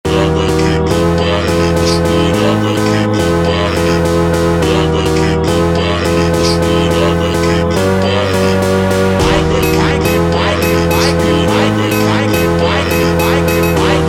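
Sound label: slowed, phonk music; funk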